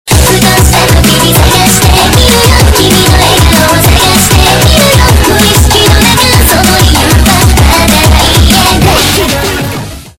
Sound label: bass blaster; freak fortress 2; sagiri